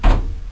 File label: Dor Close; RenderOrgan